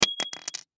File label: Key Drop Bounce; Foley; Drops; Objects; Blastwave